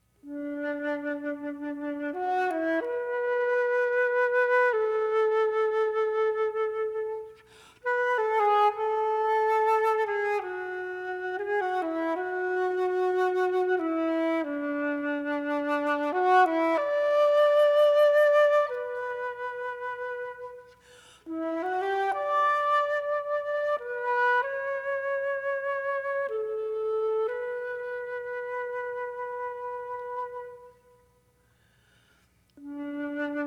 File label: Solo Flute; octet